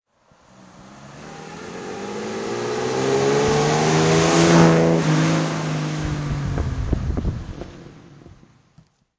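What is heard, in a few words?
jensen 541 driveby, Engine sound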